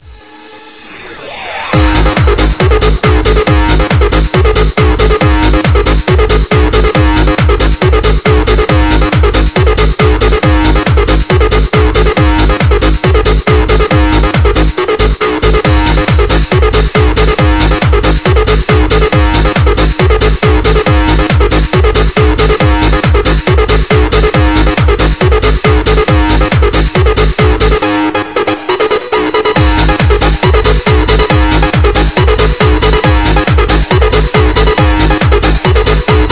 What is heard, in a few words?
bunny rave; BunnyHeaven